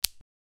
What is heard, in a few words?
arrow fully charged 16 bit